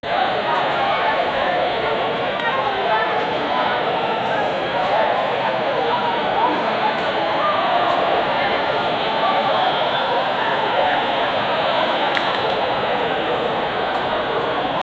the noise of the crowd, arena

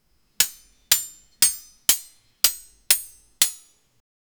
pickaxe hit game

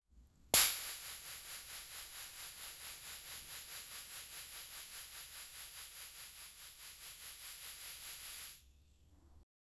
body, sound-effects

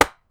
bright playful sound of a, golf

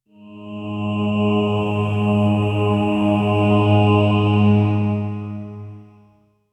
cinematic sound design, angelic choir

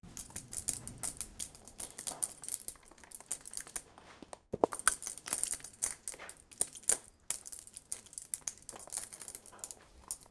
beetle footsteps tiny cli, clicking

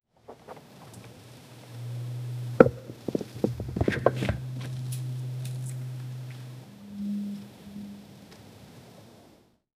echoing footsteps on cold; ancient

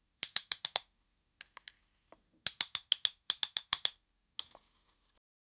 writing

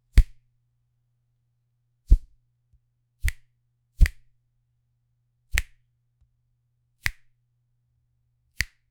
foley sound of a hand, object manipulation

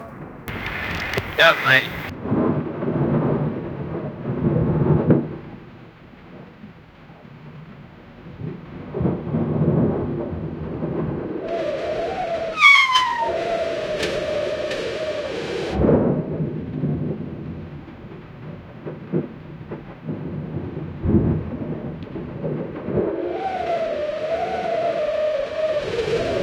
thunderous waves crashing; ship

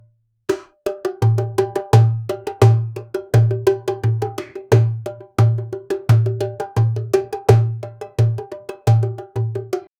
ancient egyptian arabic

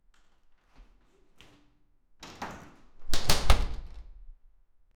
leaving house door click; departure

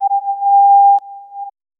soft tone that quickly ec, interface

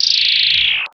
sci fi synthwave snap zap

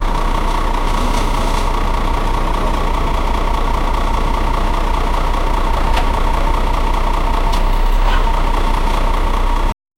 bus accident

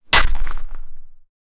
a sword made of gel

